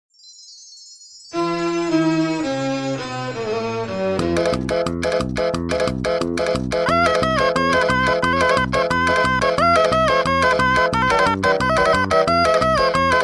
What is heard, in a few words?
otamatone